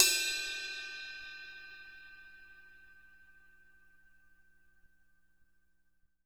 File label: CRASH BELL